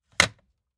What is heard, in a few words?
Phone Hang Up